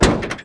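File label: Board Hit; Trickstyle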